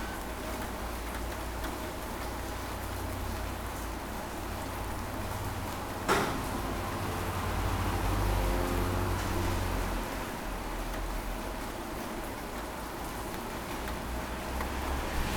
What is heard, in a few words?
rain street; 99Sounds; Rain And Thunder